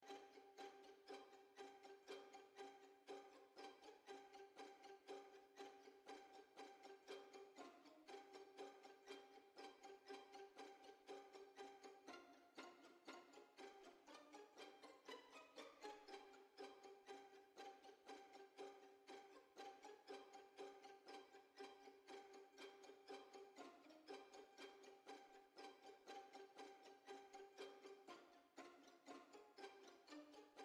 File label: Puzzle Layer; From the Shadows